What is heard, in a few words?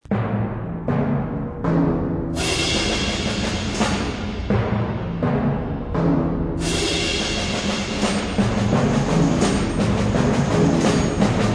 perc